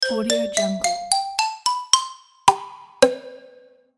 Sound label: kids educational logo; katekudasheva